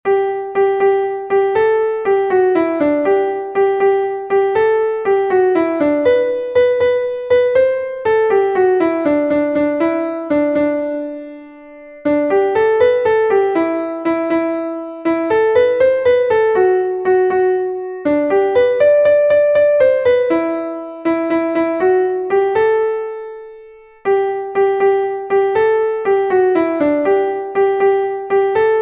soprano, himnos en piano; cantos del camino

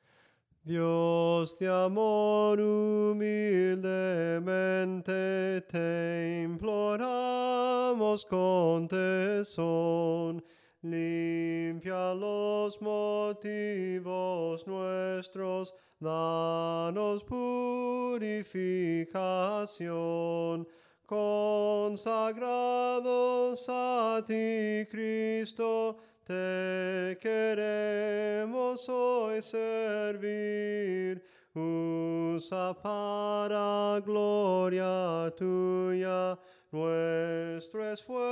SOPRANO CANTADO; cantos del camino

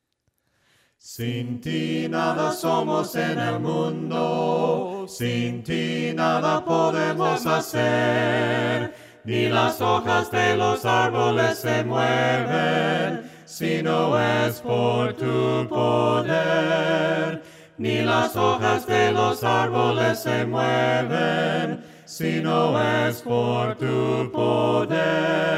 VOCES CANTADAS VOCES, himnos a capella; SIN TI; cantos del camino

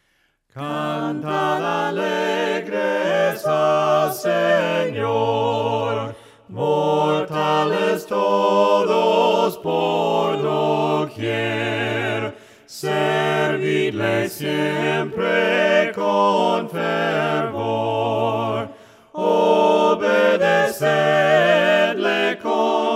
VOCES; CANTAD ALEGRES AL SENOR

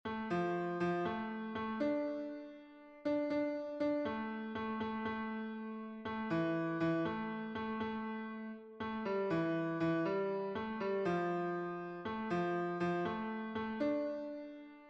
TENOR, himnos en piano; cantos del camino

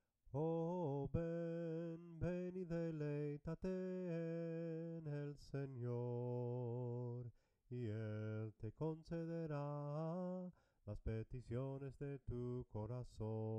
SOPRANO CANTADO, himnos en melodia soprano

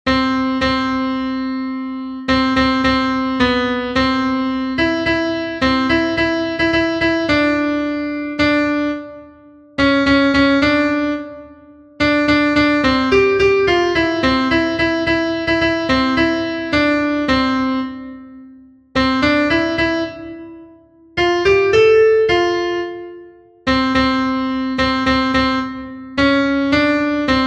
CONTRALTO, himnos en piano; 320 QUE DIOS TE BENDIGA; cantos del camino

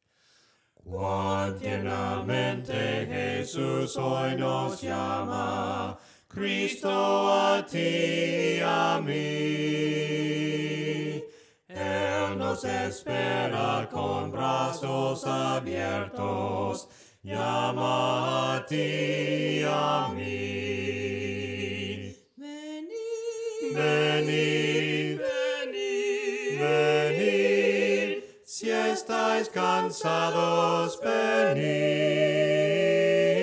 VOCES, himnos a capella; cantos del camino; CUAN TIERNAMENTE

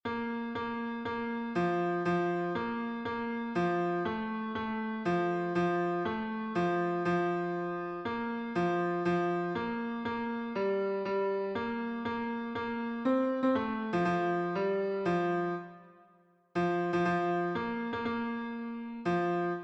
tenor

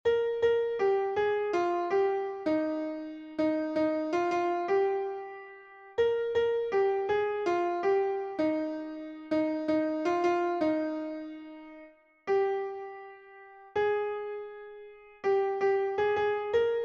soprano, himnos en piano; 92 YO AMO A JESUCRISTO; cantos del camino

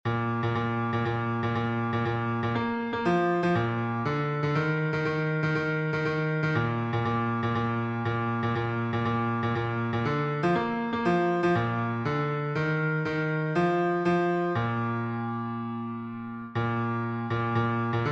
BAJO; GRAN DIA DE VICTORIA

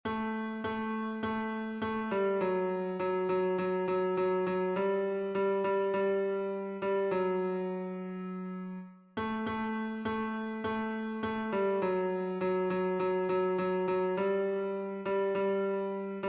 TENOR, himnos en piano; cantos del camino

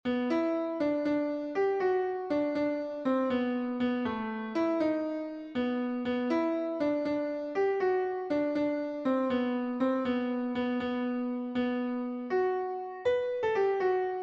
contralto, himnos en piano; 94 JESUS EL MESIAS; cantos del camino

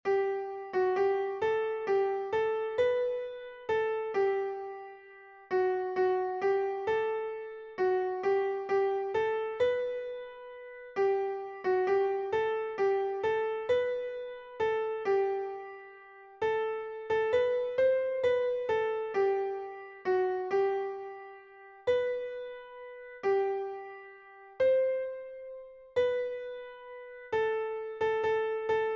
SOPRANO, himnos en piano; cantos del camino

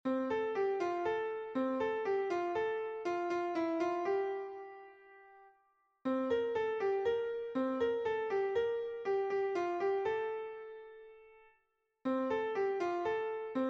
soprano, himnos en piano; 65 TE VENGO A DECIR; cantos del camino

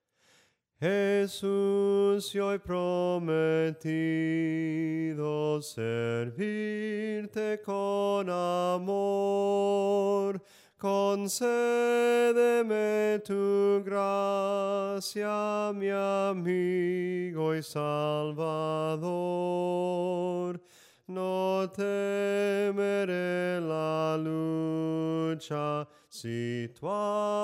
SOPRANO CANTADO, himnos en melodia soprano

